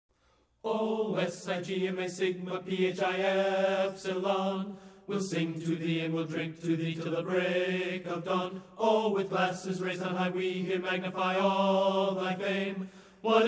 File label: Marching Song